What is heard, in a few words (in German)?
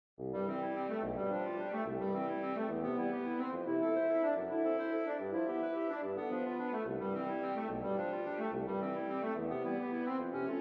agitato c-dur; 24 preludes op. 28